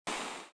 torch fire; weapons; grubs

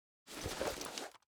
rpg7 fetch; explosives; weapons; swb

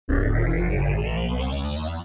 charge; grubs; weapons

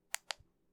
click, pager; micromayhem

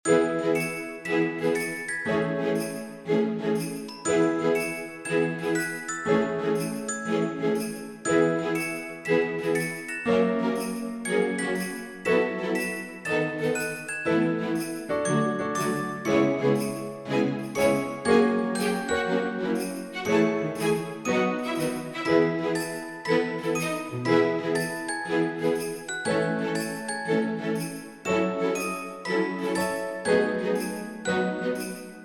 christmas music loop; blocks and bullets

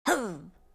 backflip; grub; grubs

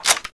l96a1 bolt down; weapons; swb

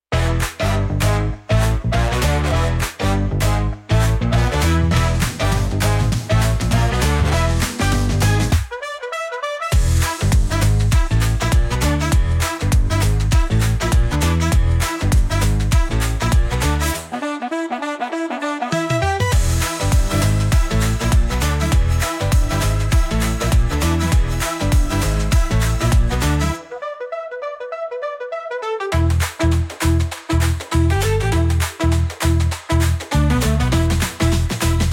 mainmenu; punt

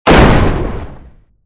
explosion short tail; weapons; grubs

